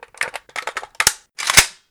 Reload; Angry Goy II; SoundEffects

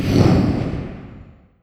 Flame Death; Angry Goy II; SoundEffects

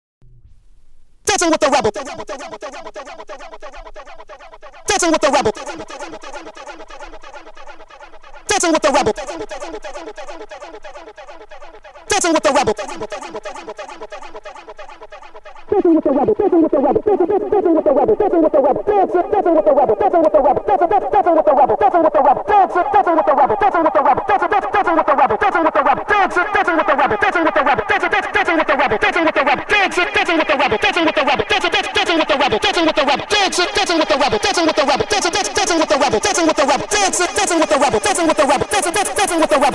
Acapella

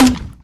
Tube Launcher; Angry Goy II; SoundEffects

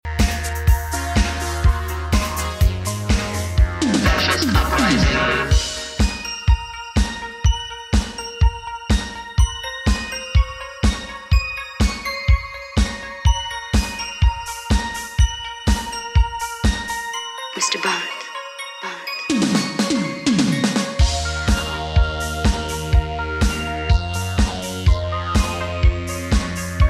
ambient; Angry Goy II

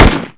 AK47 Shot; Angry Goy II; SoundEffects

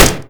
M1 Carbine Shot; Angry Goy II; SoundEffects

